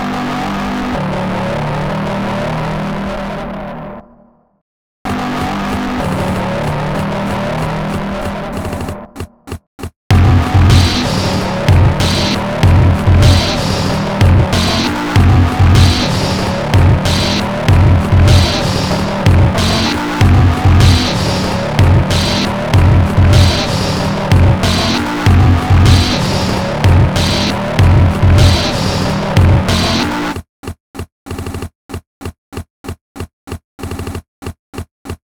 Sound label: Sine Wave